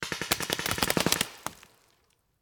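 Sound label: Paintball Gun Impact; Sound Ideas; Guns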